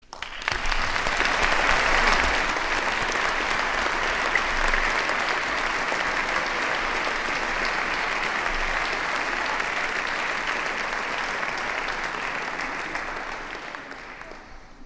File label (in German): Blechblaeserensemble Canzon La Spritata Applaus